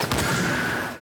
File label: begin shoot